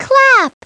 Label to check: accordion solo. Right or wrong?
Wrong